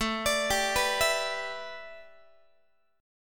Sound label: A 7th Suspended 2nd Suspended 4th A, Guitar; arpeggiated